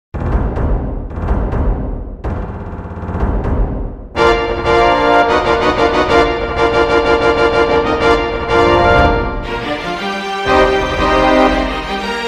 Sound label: fanfare strings brass percussion; Syntheway Strings; Aeternus Brass; percussion timpani